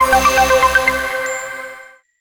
Power Off; SK Telecom

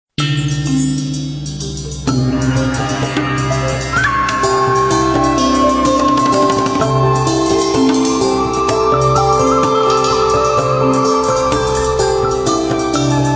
Wind passion, Ringtones; Nokia; C2-00 Southeast Asia

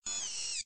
mechanism down; Realme; C2; ui

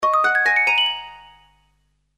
New Chat Message; Sony Ericsson; P900

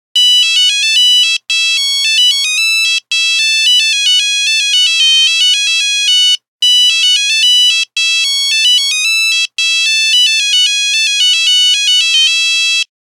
Menuet, Nokia Buzzer; Windows Phone apps

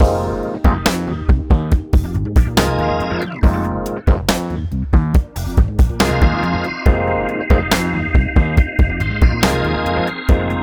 Slow Roll, ringtones; Samsung; Galaxy A56; Fun

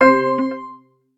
Soft; Motorola; Moto G4 Plus

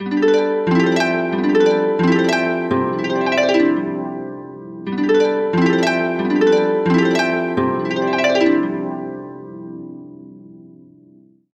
Angel Harp Ring; Pantech; Discover P9090; alarms

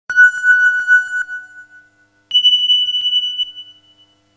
Resonance; Microsoft; Windows Mobile ringtones; Ring Tone Pack 5

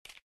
camera click; BQ Russia BQ 4072 Strike Mini

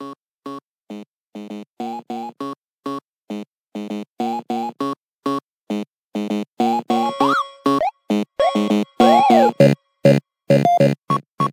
alarm; OnePlus; Nord 2 Pac-Man Edition

